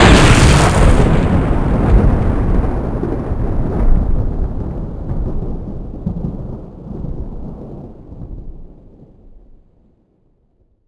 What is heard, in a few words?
electro, weapons